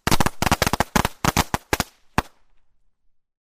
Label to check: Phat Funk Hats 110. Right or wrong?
Wrong